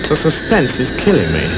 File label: suspense